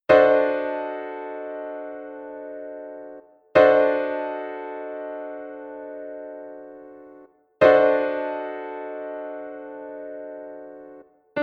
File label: Db9 Piano Chord